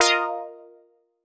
Quart Stab F; PowerFX - Nu Jazz House

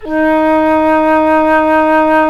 FLT FL, Wind Sects, Orchestral Winds; Roland LCDP04; 90 s Sample CDs; CMB Wind Sect

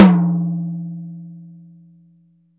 TOM, Drum Dry; East Collexion; Drum 1

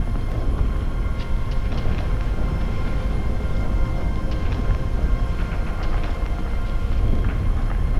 Drone Ambient; Drums & Drones

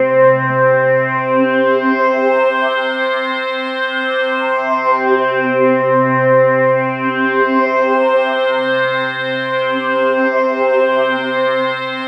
C3, SynthLine

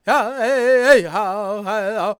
C# MIN, World Voices, LAKOTA; Spectrasonics Vocal Planet CD5

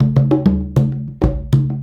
Congas; Essential Percussion

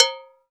HAND BELL HI

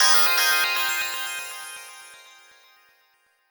Fm11; Chords; M8; Electronisounds

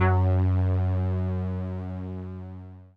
505, String Machines

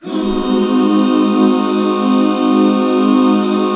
Ab 7, back chords; Sound & Vision - Gigapack II CD 1

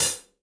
Gretsch Hi-Hat Closed; Sonic Foundry (Sony Creative Software); Vital Drums

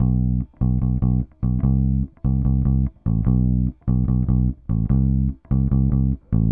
Bass, Indie Rock

